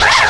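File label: PER PROCU, Procussion, percussion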